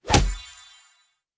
random Wild Arrows; warlords

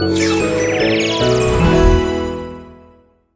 large Win; magic maid cafe

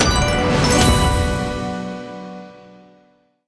large Win; steamtower

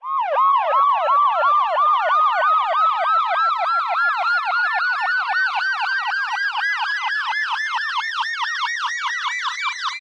near Win; reel steal

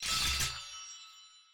spin start; codex of fortune

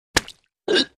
lucky mug fall down; finn

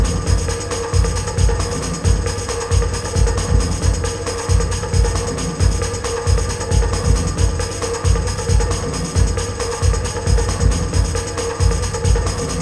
spin loop drums extra; rise of maya

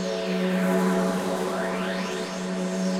space Loop; hotline2

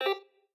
bet level; spinsane

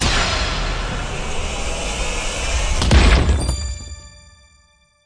final chance hit; warlords

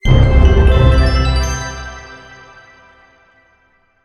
large Win Tonal C; fairymirror

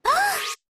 fs Pink Charge; wild worlds

